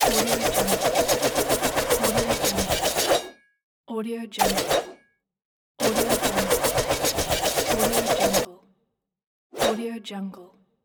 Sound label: sword spin